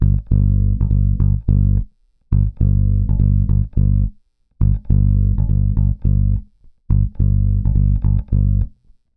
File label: Rock, Bass; Bass X